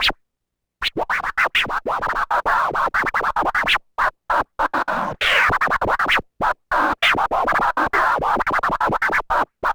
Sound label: Scratch; Matt Hoffman's Pro BMX 2; XBOX